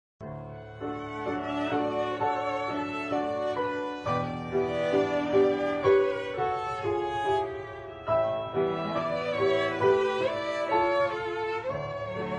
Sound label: piano trio